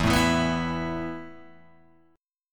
E Suspended 4th E, down, Guitar, Open G

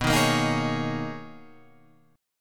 B Major 13th B Guitar Half Step Down

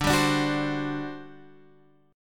G Major 7th Suspended 4th, D

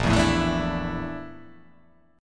A sharp Major 7th Flat 5th A sharp

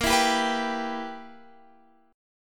E-Minor-Bb; Em/Bb strummed; JGuitar

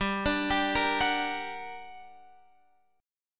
G Minor Major 7th, down, Guitar, Open F; GmM7; arpeggiated

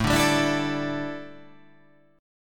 A 7th Suspended 4th, chord

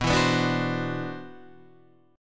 C-Major Sharp 11th B, guitar

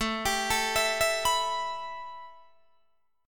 A 7th Suspended 2nd A, Guitar DADGAD; A7sus2 arpeggiated; 19,22,19,21,19,21

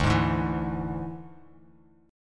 D Suspended 2nd Flat 5th D down Bass Standard; strummed

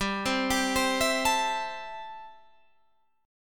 A-Minor 7th G

